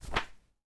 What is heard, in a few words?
Page Turn